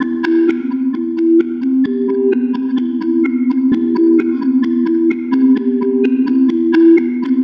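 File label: 129, song starter loop, raining bells, E minor; MOGL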